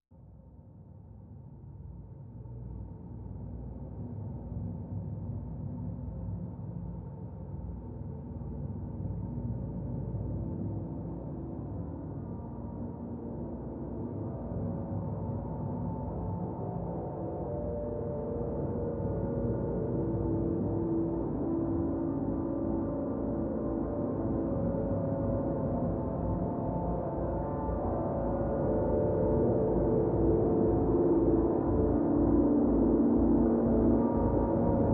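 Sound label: drone A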